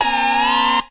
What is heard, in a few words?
outtatune; Weird and Interesting Hits; single hits